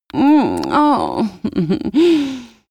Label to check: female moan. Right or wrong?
Right